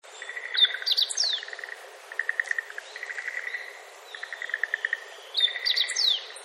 hojiro

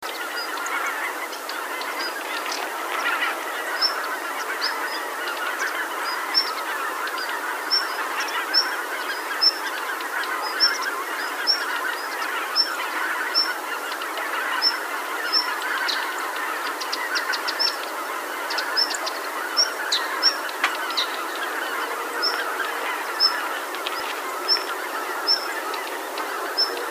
joubi

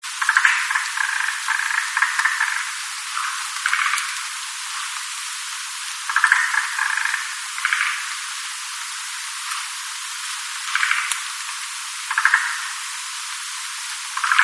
kaeru

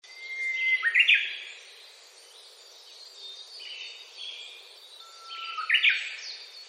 uguis

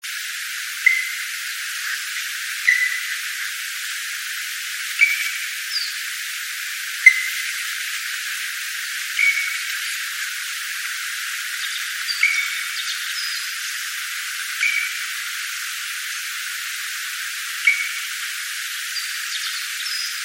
kumagera

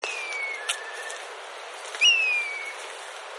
tobi

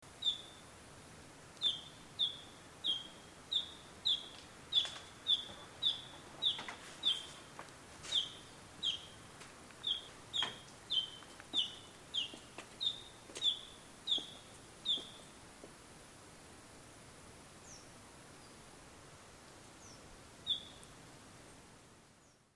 kibita

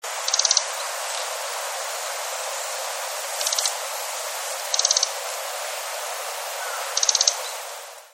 ojirobita

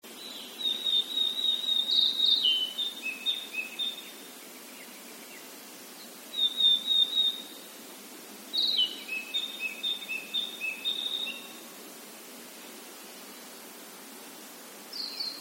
kibita

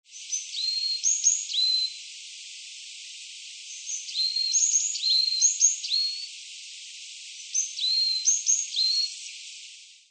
yamaga

